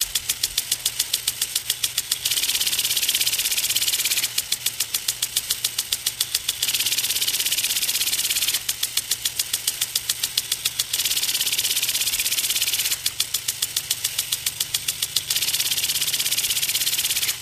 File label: Sprinkler Plastic, sprinklers